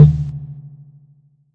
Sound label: tom tom spring verb lo